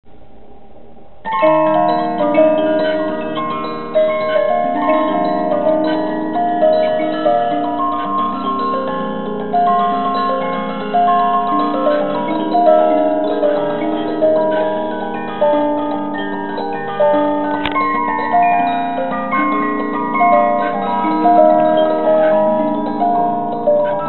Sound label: musicbox